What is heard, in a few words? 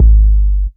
Pumping Sub, Kick Drums; Zero-G; Soundclash